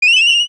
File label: bird single